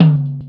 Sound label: Tom; Sonic Foundry (Sony Creative Software) - Stylus Pressure Urban Grooves on Digital Wax